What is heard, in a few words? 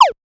Laser; notifications